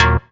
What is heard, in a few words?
Synths D#; Vengeance Samples